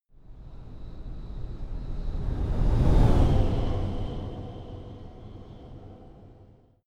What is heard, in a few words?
sci-fi vehicle train passing long; raumschiff